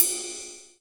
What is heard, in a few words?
CYM RIDE; roland2a